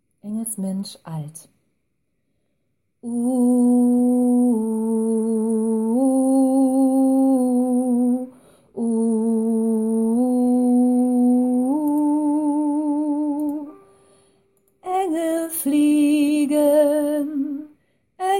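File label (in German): Engelsmensch alt, gospel choir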